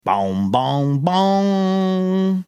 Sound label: singing first few notes